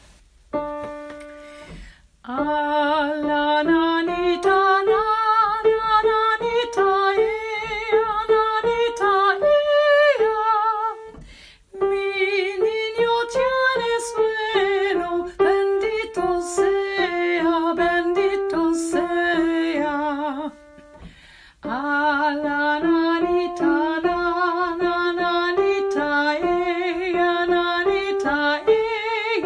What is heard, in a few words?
Lead a la nanita nana